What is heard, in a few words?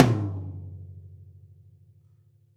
Live Tom, Percussion Hits; Zero-G; Soundclash; Acidized Wavs